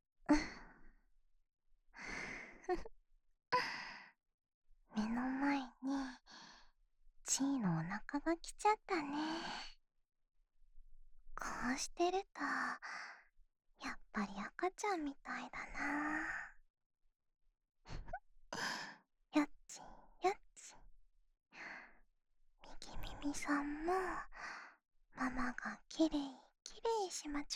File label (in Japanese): 右みみさんの耳かき